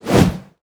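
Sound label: BOSS Attack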